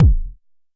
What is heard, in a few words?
Jomox MBase, drum machine; Jomox MBase 01